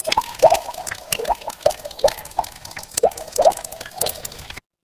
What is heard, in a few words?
water drip